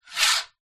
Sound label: coin slide surface